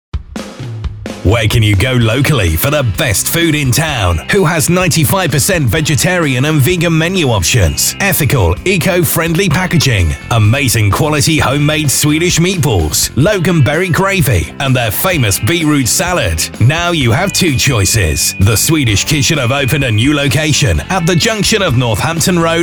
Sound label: Kitchen jingle